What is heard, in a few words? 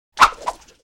swish multiple; crashes swishes impacts; Asian Show Sound Effects